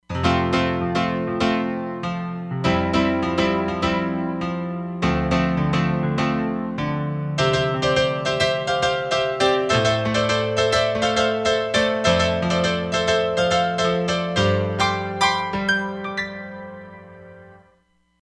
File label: E-Grand, Roland Fantom XR; Back E-Grand